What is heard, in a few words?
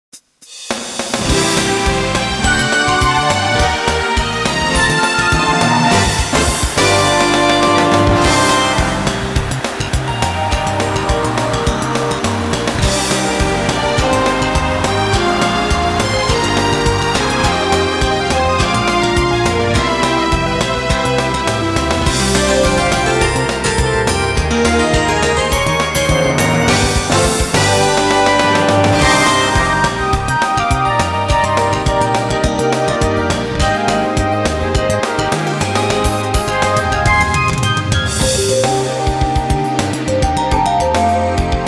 Menu; Super Smash Bros. for 3DS; Menu 2 (Melee)